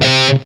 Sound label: METAL SINGL; East West; Global Sounds